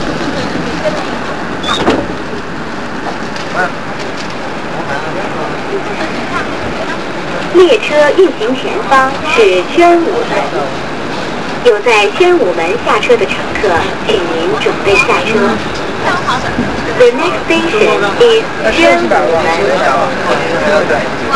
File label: subway; china